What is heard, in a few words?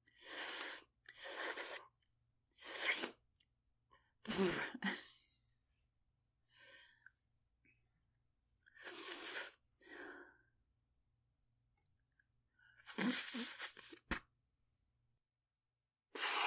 Silly SFX